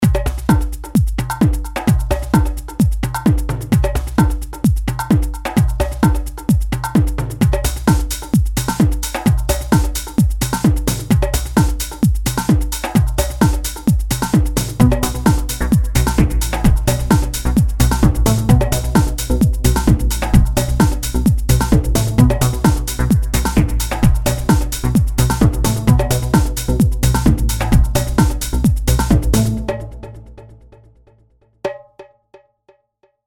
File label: ringtone electro tribe